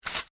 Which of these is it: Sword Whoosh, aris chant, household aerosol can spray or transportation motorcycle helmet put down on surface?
household aerosol can spray